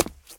cement step; Original TK Sounds

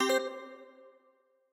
inv stop; Original TK Sounds

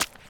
wet concrete step; Golden Crayon Sounds

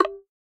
button; ChromeVox Classic Sounds

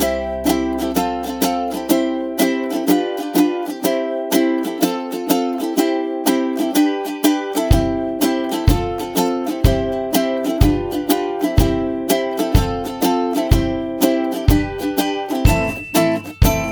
entertainment string

